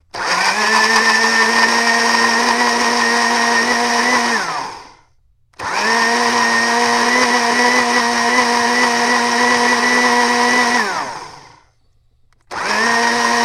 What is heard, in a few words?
Blender Banana Hi Sp